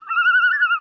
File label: loon; ambient; animal; Counter-Strike Global Offensive